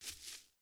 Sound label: Foot Grass Walk, footsteps